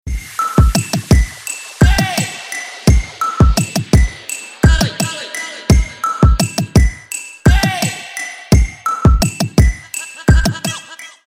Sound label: lawnmower t shirt mashup